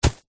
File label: footstep single soccer boot run grass; zapsplat; foley